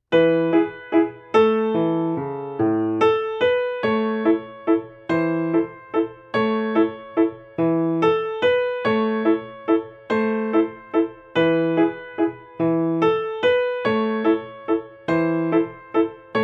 Cowboy Waltz, 144

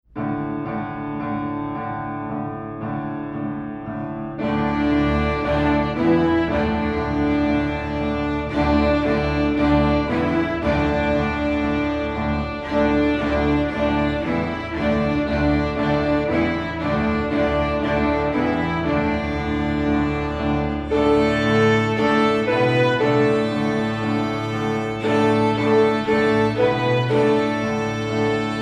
Stomp

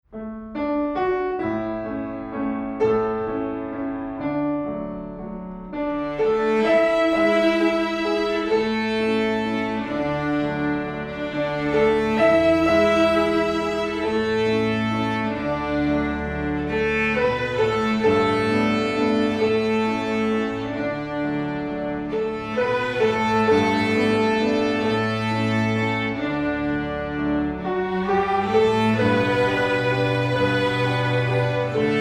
Waltz

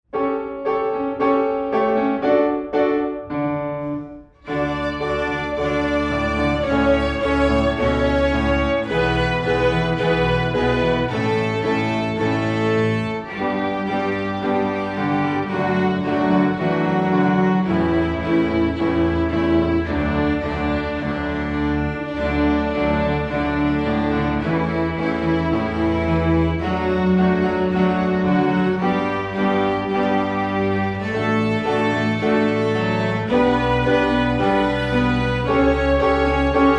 Rock

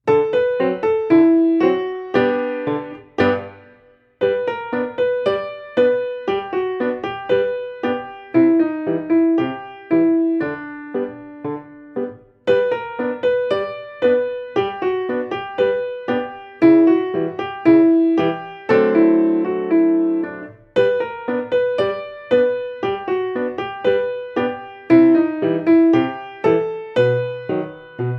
Ragtime Melody

